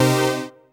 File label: dance chord